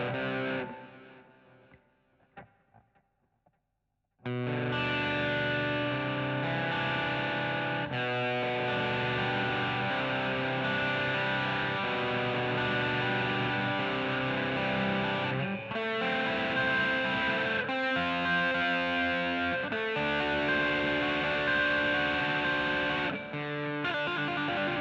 GTR A Solo

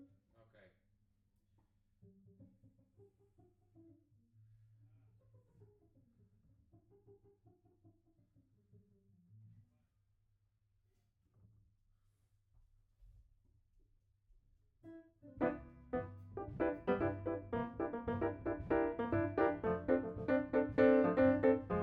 Piano B

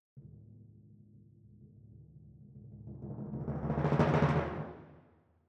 Timp Close

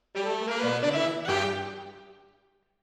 MKH AB, piano

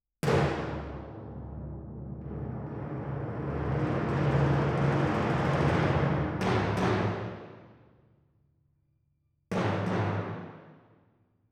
Timp

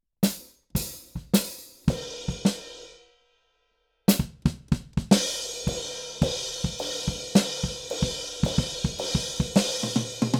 KIT

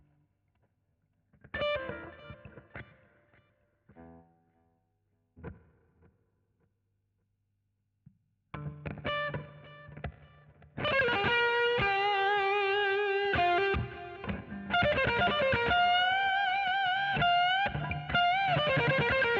GTR B Solo

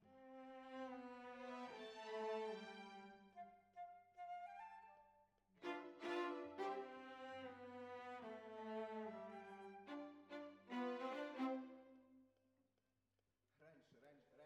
Violas, jazz for piano

